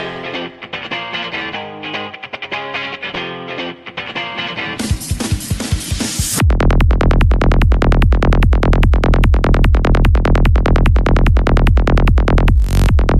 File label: psy trance